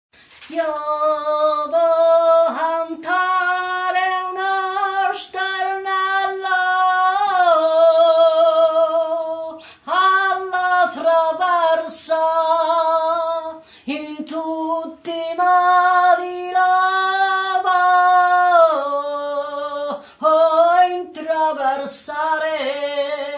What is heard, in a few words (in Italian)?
Stornelli alla traversa e canto di segatura